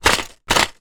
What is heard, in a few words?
household medicine tablets pack foil box shake; zapsplat